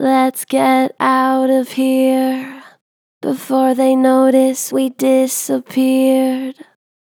Gentle Vibrato "Lets get outta here", Vocals, Natural Vibrato Gentle; Harmor Vocals